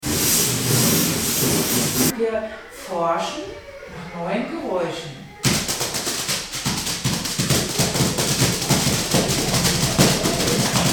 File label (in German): Experimentelles Musizieren in der Kita, Die Grossen